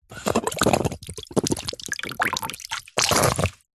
foley balloon pump suck in water bubbles gurgle squelch drips